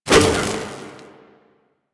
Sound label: Whoosh; Warframe; Quests; The New War; Drifter